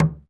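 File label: UGANDA drum, Heart of Africa; Spectrasonics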